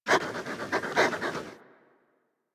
idle pant fast, Kubrow; Enemies; Warframe; Tame

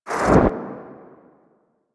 Operator Wraith Void Exit; Warframe; Focus; Powers; Wraith Movement

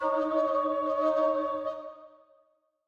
D Sharp, Player Instrument; Yoke Idol

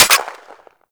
Fire Mech Single, Weapons; Warframe; Gorgon

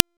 Boss Stomp Web Explosion; Warframe; Oraxia; Abilities

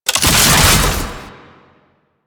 Activation Alarm; Warframe; Entrati; Necramech Hack

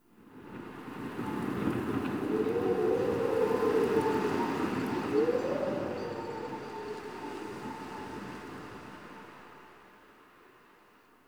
Exterior Winter Wind One Off; Warframe; Ambience; Nineteen Ninety Nine; DE

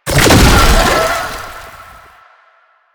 Infested Roll Start; Warframe

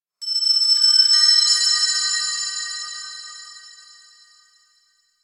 High Bell; Warframe; Ambience; Clan Dojo; Dojo Randoms

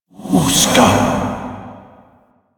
Alt Fire Whispers; Weapons; Warframe; Tenno; Grimoire

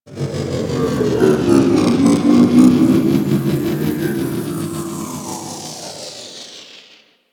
Grungy Drone Passby; Ambience; Warframe; Corpus Railjack

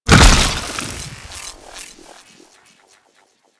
Shock Swarm Projectile Burst; Warframe; Infested; Shock Swarm Rifle

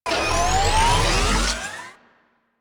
Shock Rifle Fire Alt Charge

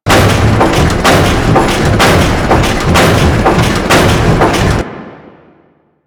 Shipyards Bg Machine Loop; Ambience; Props; Warframe; Grineer Shipyards

